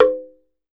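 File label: tongue drums, pitched percussion; TUNG HI; E-MU Producer Series Vol. 7 – Old World Instruments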